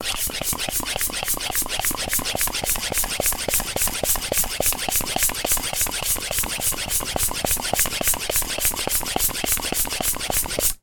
transport bicycle hand pump operating fast speed handle piston end